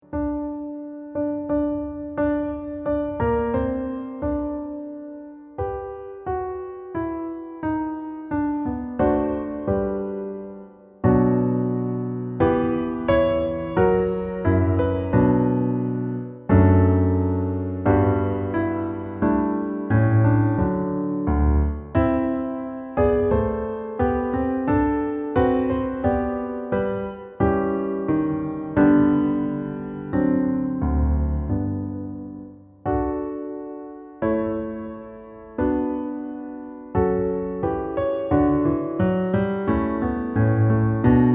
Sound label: Accompaniment; Kyrie Audition